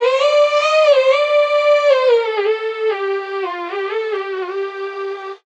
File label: vocal first light Bb; SD AURORA Sample Pack; Slate